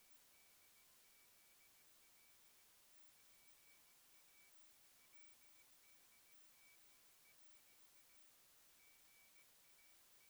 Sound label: 28040kHz RF; SK6OSO